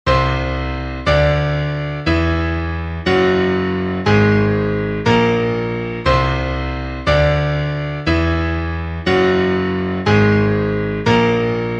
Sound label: ascending tonalities; bach torus